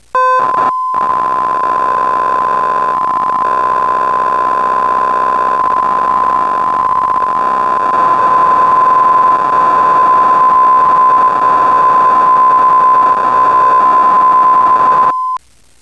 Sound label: Real Combat